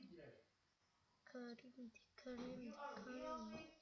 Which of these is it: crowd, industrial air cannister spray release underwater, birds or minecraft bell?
crowd